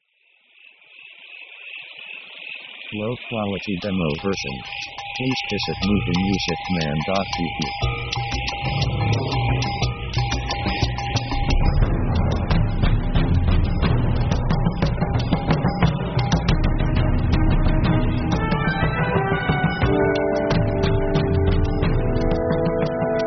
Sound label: running lion, Action